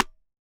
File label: Bongo Handpalm; Best Service; Festa Latina VOL-1; Single-Hits